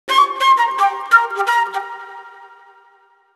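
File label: flute, disco; Best Service ProSamples vol.48 - Disco Fever; AKAI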